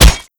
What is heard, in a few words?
axe hit